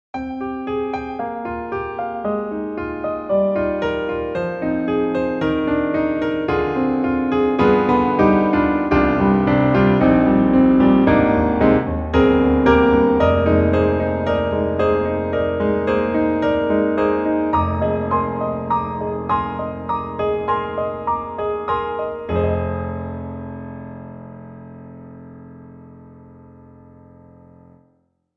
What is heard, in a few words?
grand piano classical; IK Multimedia; SampleTank3